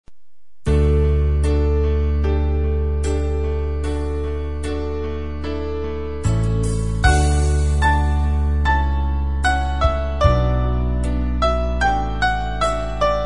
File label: Melody